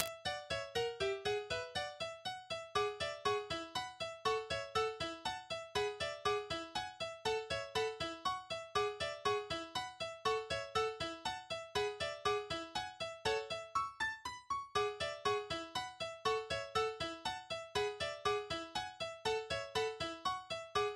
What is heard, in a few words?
Music Box